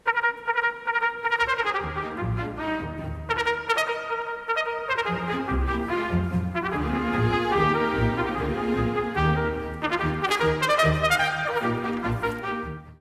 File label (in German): Trompete